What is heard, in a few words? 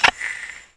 Space menu popup; Microsoft; Windows 98